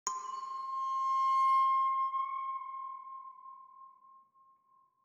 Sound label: ambient